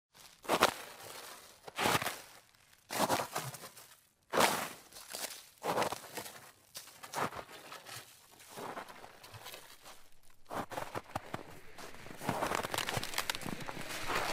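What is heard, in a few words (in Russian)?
man walks along a park path, a sled with a screaming child rides past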